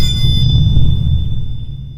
sonar Tail Water Close Beacon; Barotrauma; Real Sonar

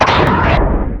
Mudraptor Death; Barotraumatic; Cthulhu; InsanityMonsters; Hallucination2

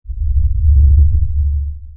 sonar Ping Air Medium Beacon Directional; Barotrauma; Real Sonar

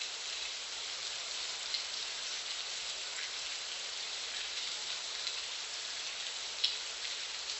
rain loop; Environment; EK modern

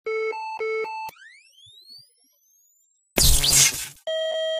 Defib; Barotrauma; Neurotrauma

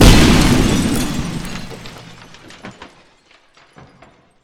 glass break; Barotrauma; Subsurface

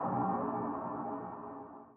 sonar Tail Air Medium Shuttle; Barotrauma; Real Sonar

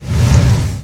flamethrower; Weapons; EK modern; Barotrauma

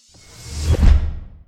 docking port, Door; Barotrauma; Subsurface

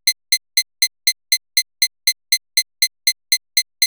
Countdown; Thalassophobia Restored

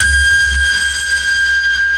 sonar Ping Water Medium Shuttle; Barotrauma; Real Sonar

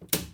switch; Barotrauma; EK modern

